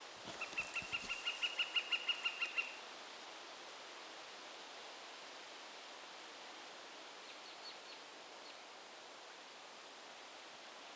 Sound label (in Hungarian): eszaki hangyaszkullo hangja